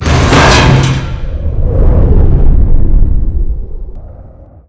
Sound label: shaft sled by; ambient; Half Life 2; Episode 2; outland